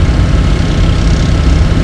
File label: driller, ambience